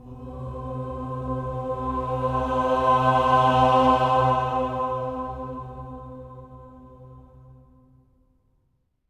OCT G3, swell octave, voices

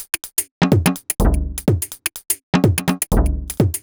Top C# 125bpm, House Drumloops; 90 s Sample CDs; Zero-G House Fabrik Drumloops; ZG Drumloops

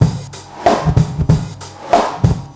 Hip-Hop, Bonus Loops; Sonic Foundry (Sony Creative Software); Hip-Hop Street Beats Construction Kit; Street Beats by Poogie Bell

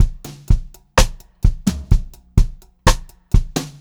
Pop-Rock Drumloops; 126 POP 4

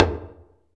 Mix Percussion

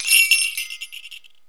SLEIGH BELL; PERCUSSION; AMG - Vince Clarke Lucky Bastard

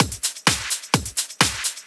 Clubhouse Loop 128 BPM

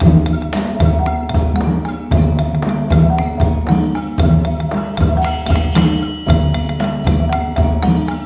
MALAY L, Heart of Asia; Spectrasonics - Heart of Asia CD2

